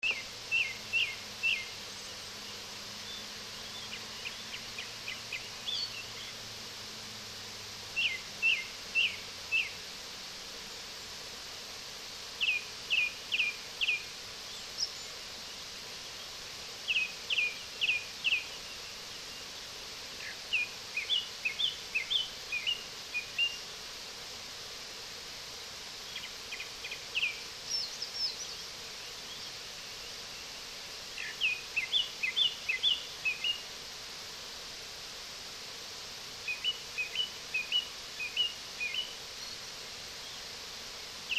アカハラのさえずりです。; アカハラはツグミ科の小鳥で、スズメより一回り大きく、水田が隣接する山林で「キョロンキョロンとないている姿を見かける。

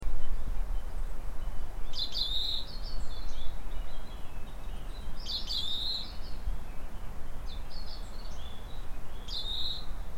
ジュウイチの声です; 畑の休憩所でそばのエンジの木より聞こえてきたジュウイチと言われる野鳥です。; この野鳥の仲間には、カッコー、ホトトギス、ツツドリなど、ほかの野鳥に子育てを託す「託卵」の習性のある野鳥です。

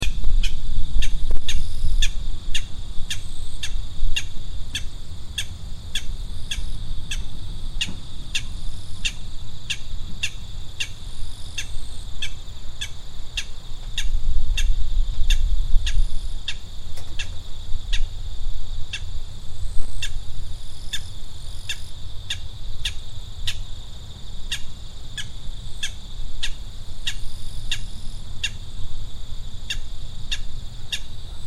アカゲらの声です。; この音声は山の畑、以前馬場として使っていた周囲の木より聞こえてきた者です。; アカゲラ

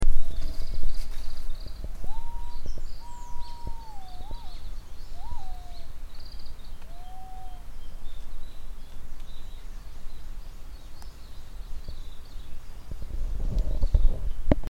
アオバトの声です。; この声は、山の畑で録音しましたが、一度鳴くと、次はいつ鳴くのか分からないので録音は難しいです。

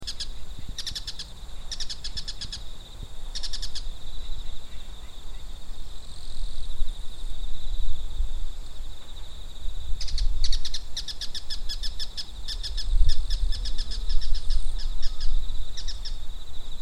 モズの声です。; 昆虫や蛙、カナヘビ、小鳥などを食べる。; 留鳥であるモズは秋の野鳥とは言えないが、俳句の秋の季語にもなっているのでここで挙げてみた。; 大きさはすずめより少し大きく、くちばしが猛禽類のようにカギになっていてかなり狂暴である。

